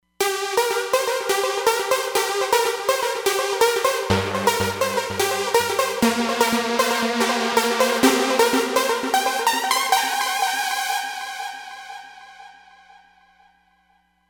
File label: Phuture Saw, Roland Fantom XR